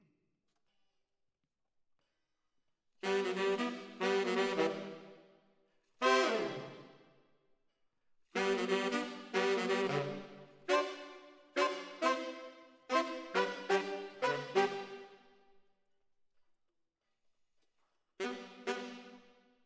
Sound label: ORTF SCHOEPS, piano